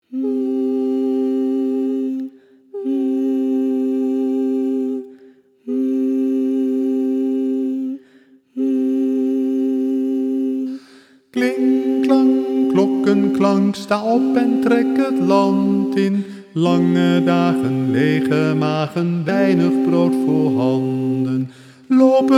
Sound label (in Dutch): Kling klang